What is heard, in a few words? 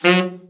BRA TENOR SN, SAX